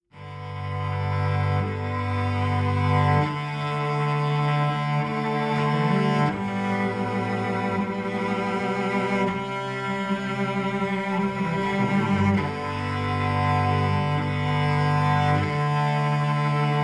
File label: Prelude Acoustic